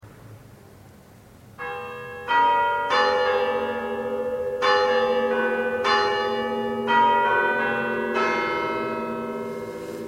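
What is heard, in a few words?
three quarter hour, chimes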